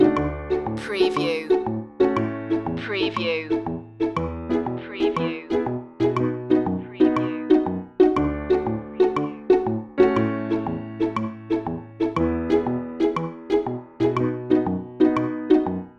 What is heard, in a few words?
cartoonish tic tac loop